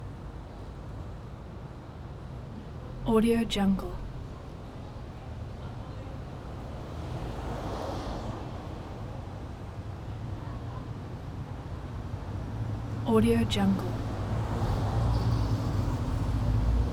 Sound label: traffic midday santa monica boulevard busy